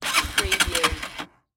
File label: Motorcycle Dirt Bike Engine Turning Over